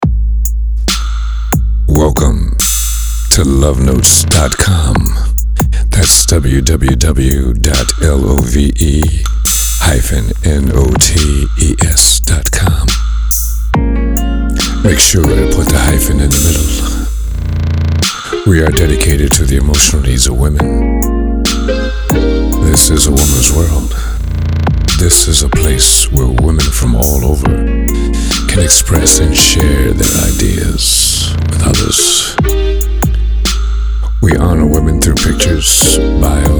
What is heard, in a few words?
Rap